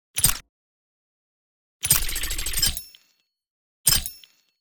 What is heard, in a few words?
Metal Sweeteners Designed the bulky one; aXLsound